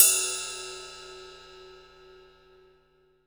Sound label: F Stand Ride, Fusion Kit; Akai MPC500